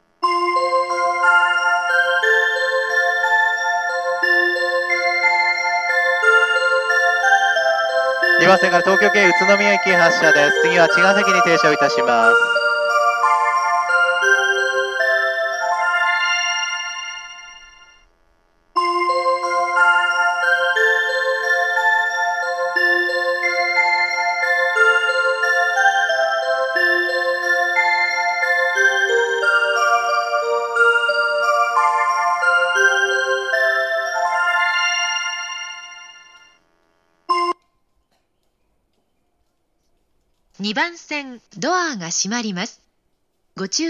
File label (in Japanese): 発車メロディー; 東海道線; 始発・待避等で使用されます。; 東海道線（上野東京ライン）; 横浜・品川・東京・上野方面; 湘南新宿ライン 渋谷・新宿・池袋方面; たなばたさま; 待避は先行列車が遅れると鳴らなくなるので、空待避か始発がおすすめです。それでも曲が長いので余韻切りが多いです。